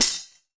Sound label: DAF HIT; WorldSounds